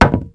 wood; Mafie 1

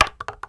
wood; Mafie 1

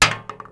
metal; Mafie 1

